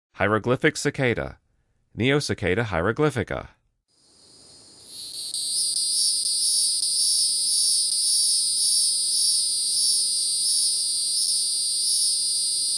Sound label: Hieroglyphic Cicada, Insects; The Songs of Insects